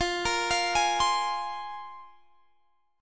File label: C 7th Suspended 4th Sharp 5th